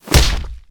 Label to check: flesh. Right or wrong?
Right